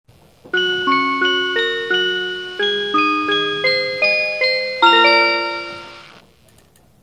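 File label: JR West chime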